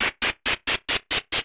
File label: Dungeon Stairwell Bottom Ascending; Open Zelda; Quest Designer 2.0.4b